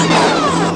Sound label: flameout, cartoon